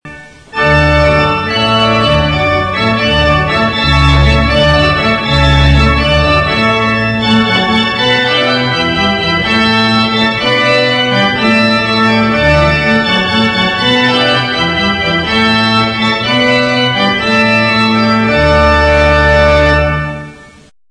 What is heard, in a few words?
hornpipe